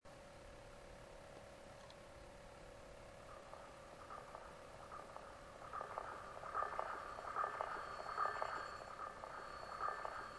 electro improv

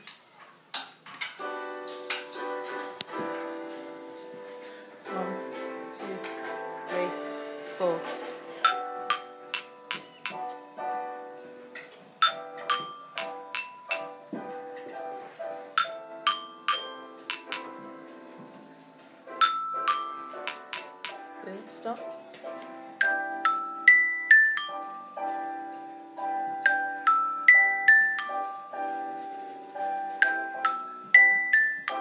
jazz glock impro

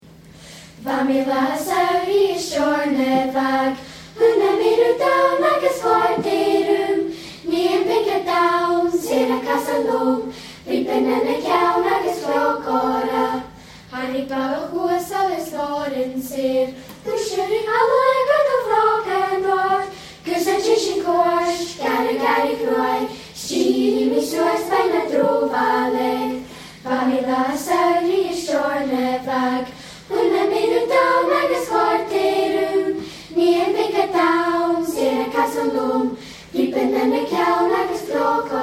choir the stornoway song; port ellen ps

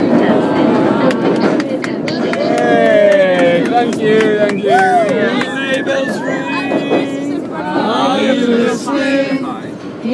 tube singing

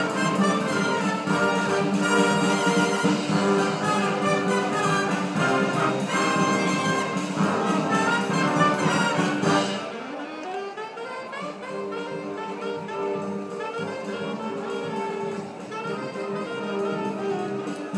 brass band; Eton Manor; Paralympics

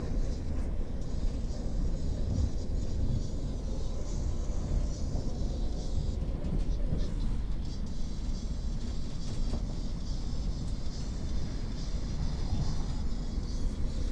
leaky headphones

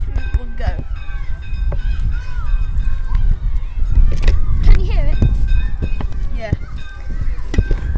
bells of school

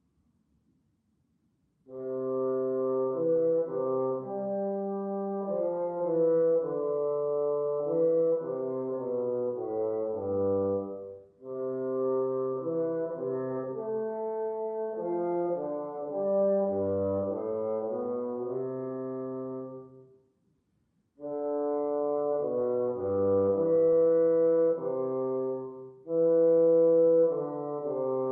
bassoon studies; julius weissenborn

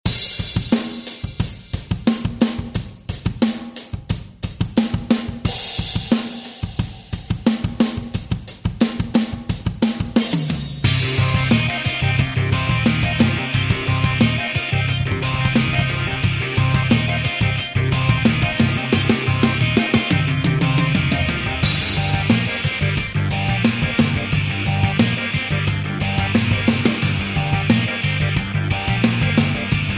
rock instrumental

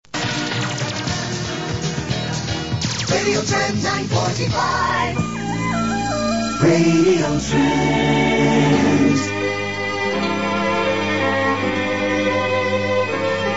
radio jingles; Radio Trent 945

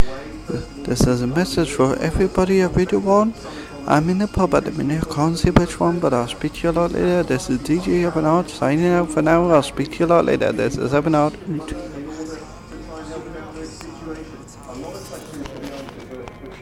pub

